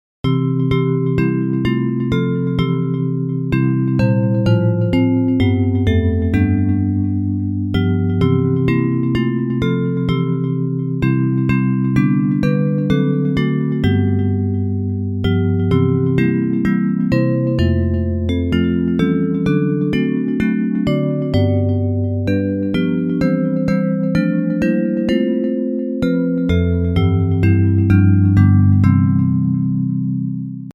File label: Bells, hymn